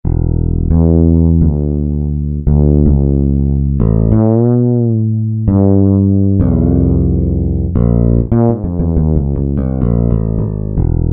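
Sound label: BS Warm Fretless; Roland L-CDX-01 Rhythm Section Instruments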